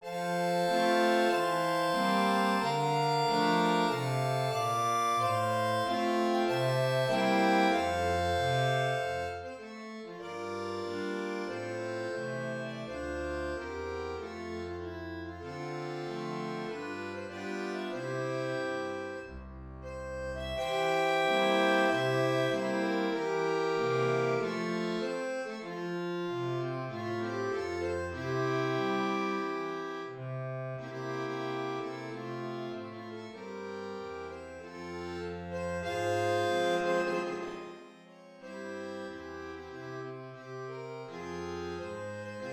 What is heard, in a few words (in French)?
hymne national portugais; keil alfredo; portugueza